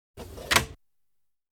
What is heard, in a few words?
Diesel Trains Sliding window; The BBC Sound Effects Library